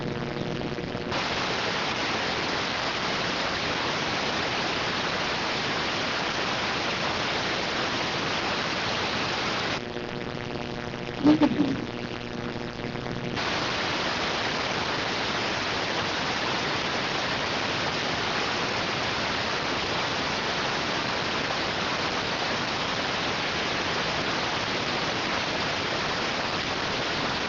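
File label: radioteletype receive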